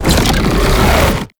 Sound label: Rupture Cast; Roathe; Warframe; Tau; Abilities